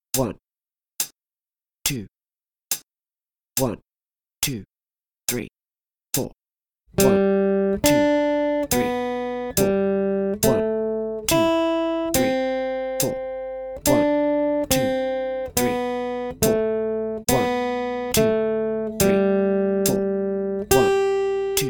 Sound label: 3 strings, guitar